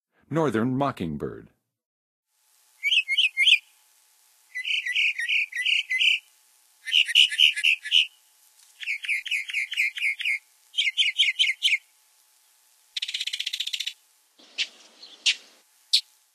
Northern Mockingbird, Songs of Birds of North America; Western USA; Western USA Songbirds